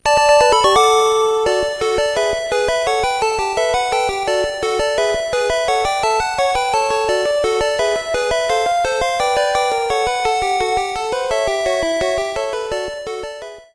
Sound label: victory music; pokemon